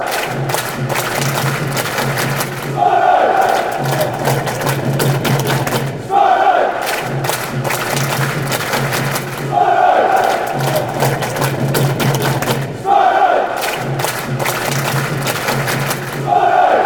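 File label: claps, football fan chants; spireites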